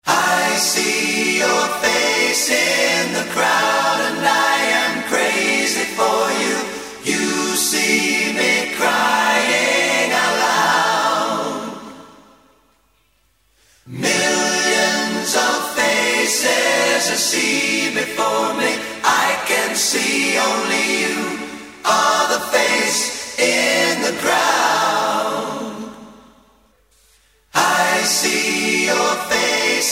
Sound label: vox